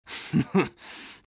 laugh evil; garrysmod; tf2-content-gmodcontent

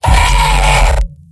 pyro m pain sharp; tf2; garrysmod; mvm

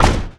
cap spin end; doomsday; Garry's Mod; tf2

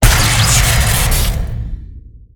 rocket launcher crit; weapons; tf2

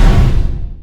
projectile impact; Garry's Mod; popp5 lily love

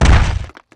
walk, tiny

